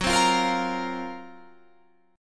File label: F sharp Major 7th Flat 5th, Guitar, Drop D